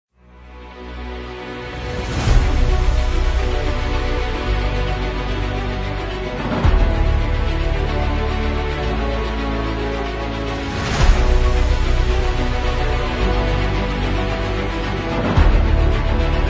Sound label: Short Trailer